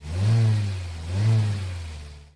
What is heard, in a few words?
police eng rev nutral; Blind Drive